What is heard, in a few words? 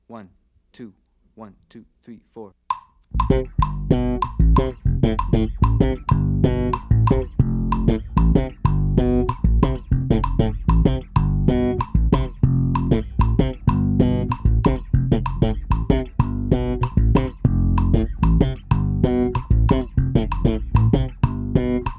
bass songo slap with different tumbao